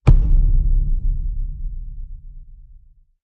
Hit Low Thump

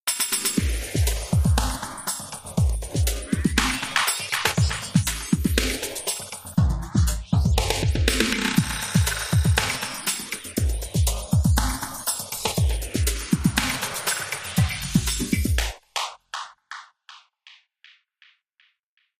Drums & FX

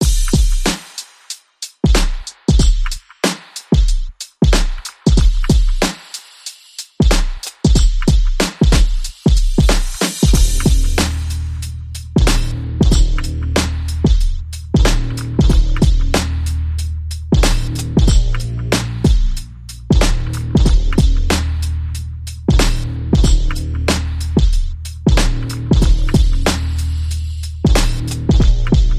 Drums and Bass Only

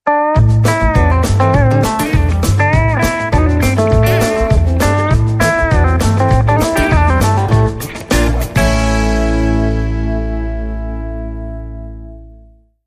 Saloon, Jingles

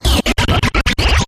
Interference Stutter

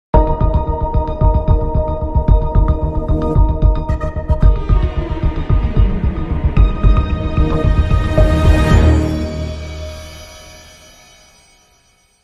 Crime Sting

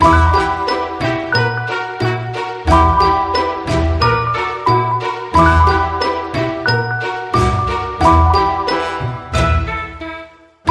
Hip Hop, No Drums

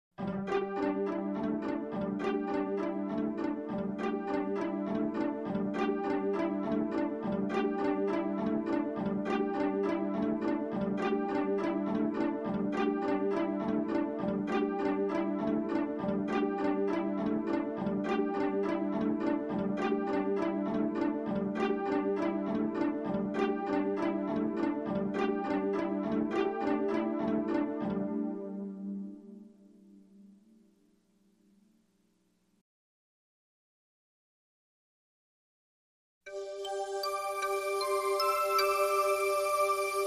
Rhythm

